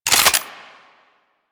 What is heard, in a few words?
Heavy Shotgun Reload Finished; Weapons; Warframe; Tenno